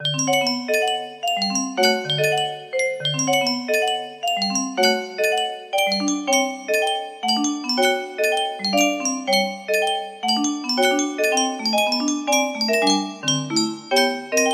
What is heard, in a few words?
random ditty